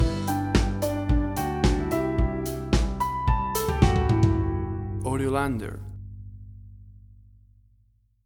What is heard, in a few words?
Afternoon Motivation Stinger